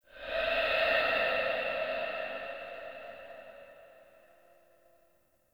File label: Interior Texture High Screech Calls; Ambience; Indoor; Warframe; Infested Eris